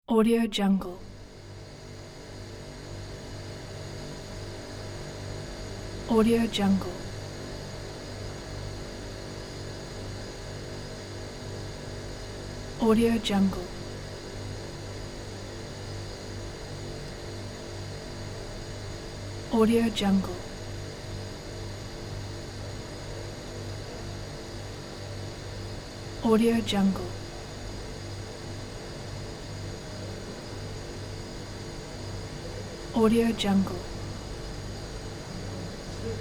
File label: night city noise; worldwidesounds